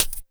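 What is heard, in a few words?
percussion, Drum & Bass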